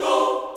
choir

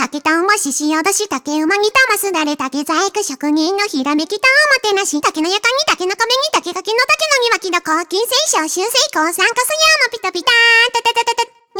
Vo Main

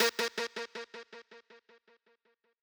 lead

